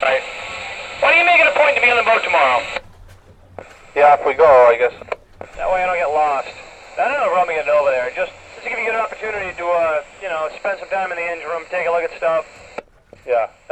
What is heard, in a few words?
INT CB Radio Boat Off; Poetry Project